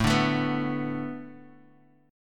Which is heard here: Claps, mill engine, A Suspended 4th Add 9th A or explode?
A Suspended 4th Add 9th A